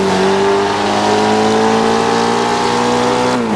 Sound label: third, vehicles; gmod; tdmcars; mitsu evo x